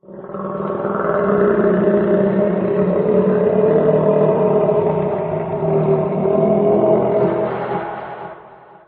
monster growling

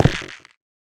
step, stem; Minecraft

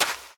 sand run, step; Minecraft; Remastered

